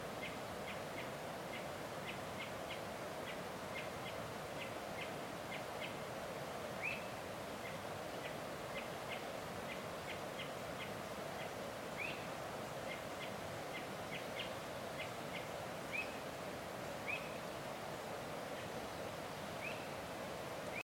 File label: Great thrush, Zorzal Grande (Turdus fuscater)